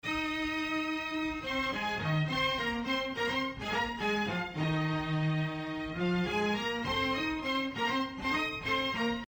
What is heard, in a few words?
Strings